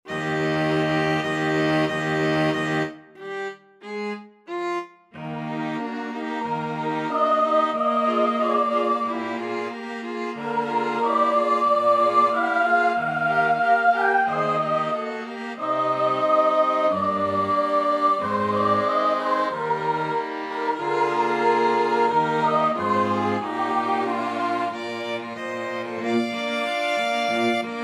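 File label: soprano string; porgi amor; voice and ensemble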